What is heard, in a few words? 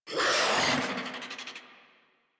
Infested Charger Attack, Enemies; Warframe